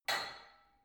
barbell only lift up reverberant soft, fitnessstudio